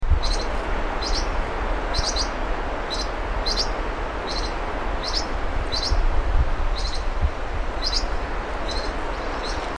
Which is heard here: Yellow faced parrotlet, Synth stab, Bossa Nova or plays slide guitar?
Yellow faced parrotlet